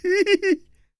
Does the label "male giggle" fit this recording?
Yes